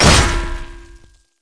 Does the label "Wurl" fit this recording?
No